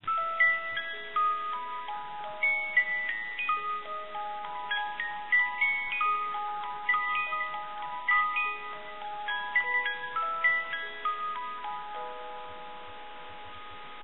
Coin Reward; Five Nights at Freddy's; Help Wanted

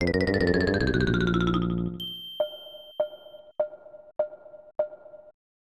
Unused Jingle; Lode Runner Twin; SNES

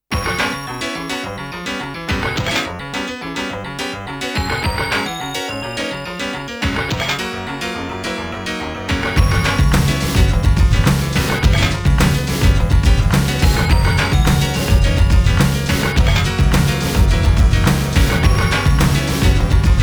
Composite result announcement theme; Namco Game Sound Express Vol.22; Gun Bullet

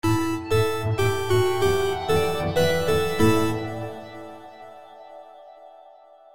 item collect

